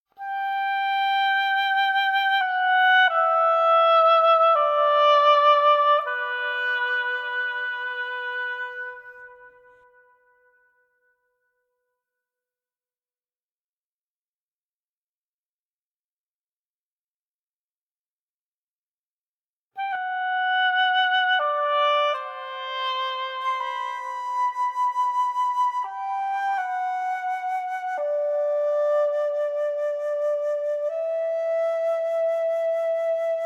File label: Graveyard - Loop (Woodwinds Stem); PS4 PS5 Switch Windows Xbox One Xbox Series XS